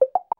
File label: Whisper, notifications; Vivo X27 Pro